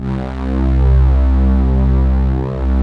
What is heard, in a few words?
ANALOG PAD; Pro-Rec - Synthsonic